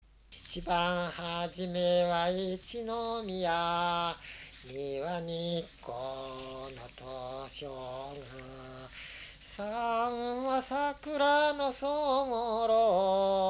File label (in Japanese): itibanhajime, minyou; 一番はじめ; 佐倉市